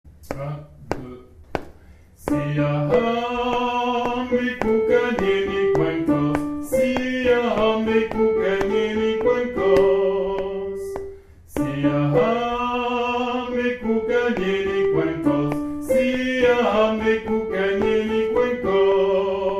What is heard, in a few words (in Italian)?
Soprani; SIYAHAMBA